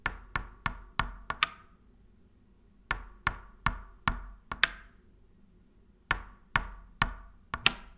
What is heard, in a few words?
Moving Piece On A Boardgame